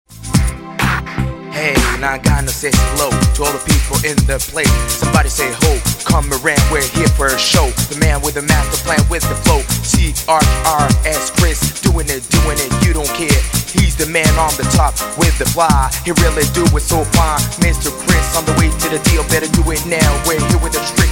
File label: Rap Version